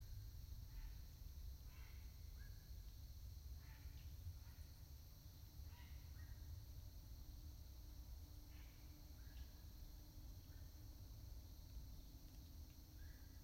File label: yamanakako sound